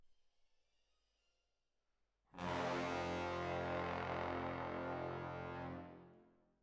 Hall, Jazz for piano

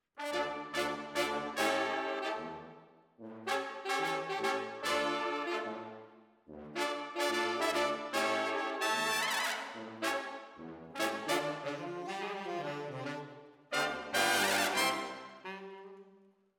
ORTF, Jazz for piano

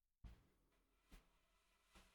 SNR Bot

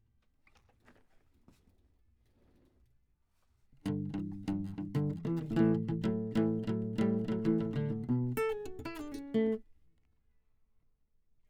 Classic Guitar

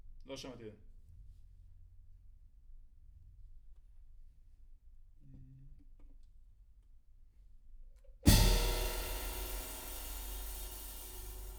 Room A

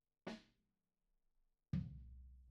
Kick In

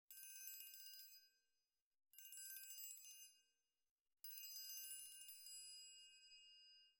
TRIANGLE CLOSE

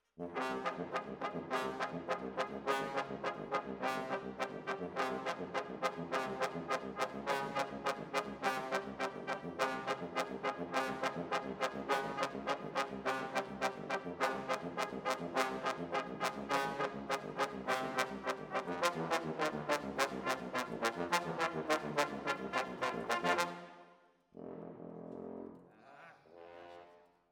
Brass

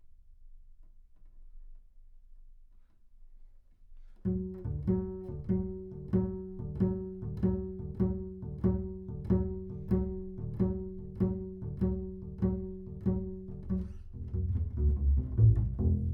Bass Room

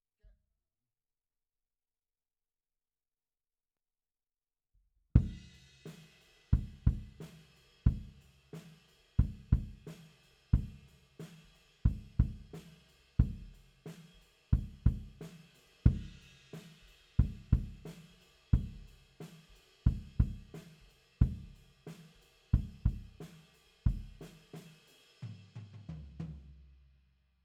BD OUT

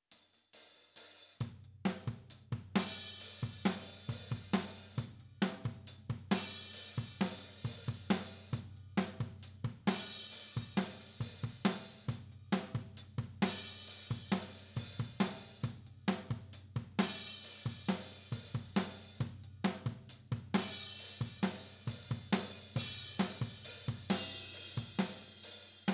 Mid Tom

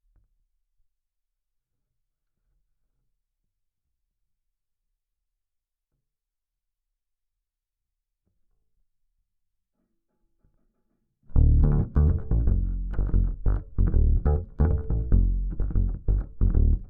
Bass DI

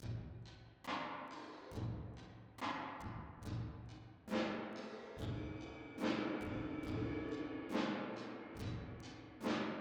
Drums Verb

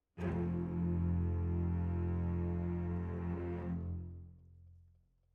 Cellos

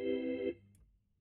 Hammond

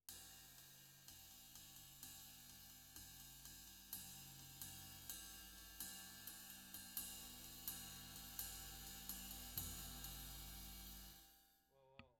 Floor

